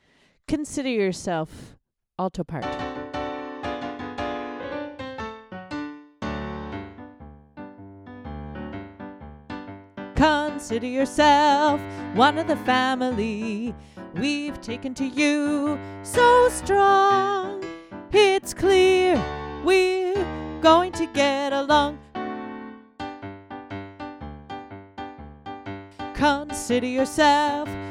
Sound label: alto